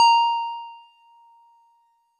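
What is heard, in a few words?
Wood Piano DX100 A#1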